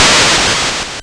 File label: NES FX Explosion, chiptune; Sonic Foundry (Sony Creative Software); Nintendo Entertainment System